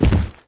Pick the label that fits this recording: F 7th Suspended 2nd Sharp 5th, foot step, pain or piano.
foot step